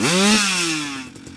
rev Complete, Engine

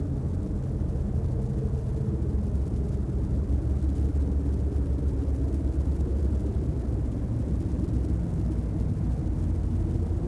Eerie Junkie Atmosphere; noise; Max Payne; XBOX

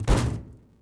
bodyfall mtl; foley

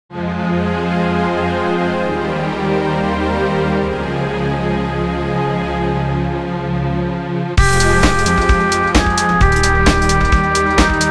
Pinball, Music; Pure Pinball

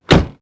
car door close; garage; Max Payne

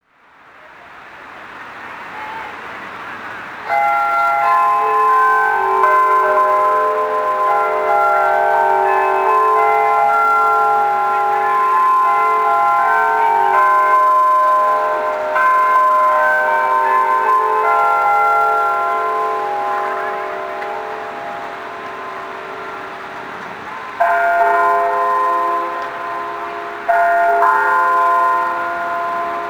Barce Bells Night; ambience; Project Gotham Racing 2

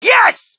crowd, shout; Mike Tyson Heavyweight Boxing

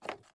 fs creakwood, footsteps

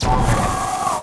turbo; ships; Quantum Redshift; XBOX; kaitlyn

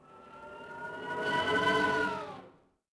Passby, vehicles; Coruscant

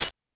beep light; Star Wars Knights Of The Old Republic II; XBOX

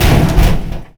Impact Medium; Totaled; XBOX

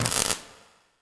Electric Spark; Incidentals; Mace Griffin Bounty Hunter; XBOX

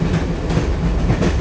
train loop; Soultree

